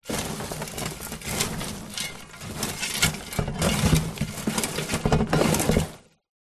industrial shovel spade move sticks twigs plastic wheel barrow